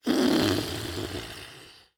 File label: Cartoon Balloon Fart Snore; AdobeCC